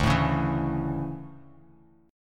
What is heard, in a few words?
D Major 7th Suspended 2nd, D, down; strummed